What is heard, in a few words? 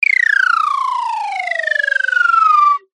cartoon slide whistle descend fall stutter fast